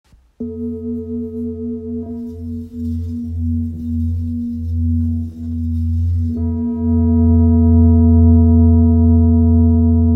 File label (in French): Bol ramgandi fa